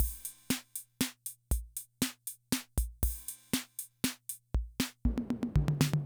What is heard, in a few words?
Loop, rhythm 55, classic machines